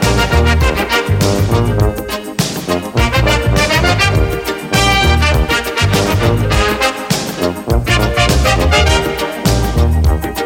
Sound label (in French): accords